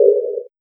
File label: Vinyl Snare; Sonic Foundry (Sony Creative Software); Esoterik Beatz; Vinyl101 Kit